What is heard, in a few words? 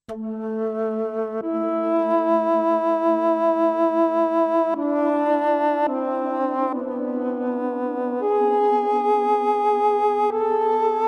Concert Alto Flute; NADA Instruments